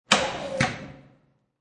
Electric Switch, Industrial Electric Switch 01: Power surge; Switches; Switch Sound Effects; Objects; LynneMusic